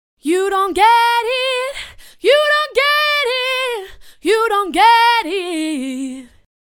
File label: vocal dry